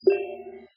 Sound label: RIM Tonal; Sappo